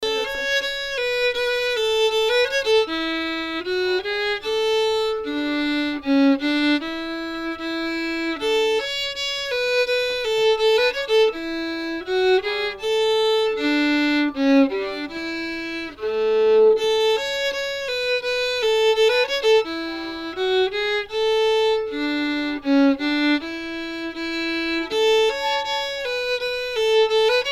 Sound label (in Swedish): Stämma